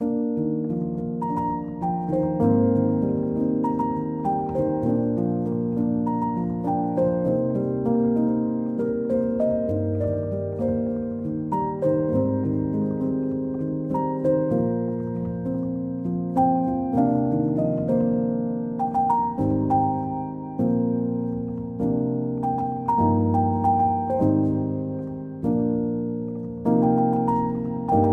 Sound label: Piano Instrumental